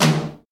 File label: high tome drum sample d sharp key, Royality free tome drum sample tuned to the D# note.